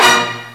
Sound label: TRIAD, FAIRLIGHT CMI IIX STRINGS